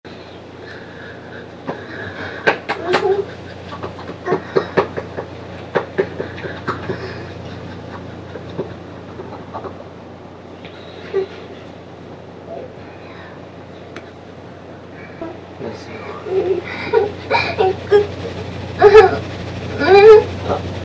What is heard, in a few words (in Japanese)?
えち音声